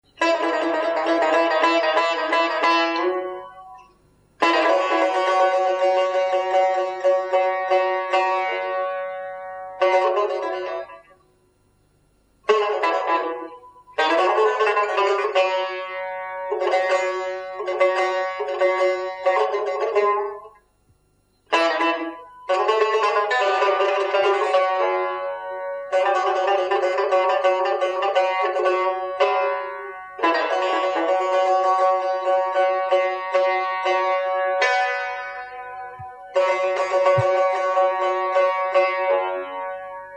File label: tar, instrum